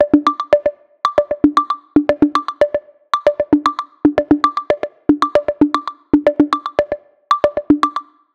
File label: 115 Perc Jungle; Percussion; Zero-G; Future Wave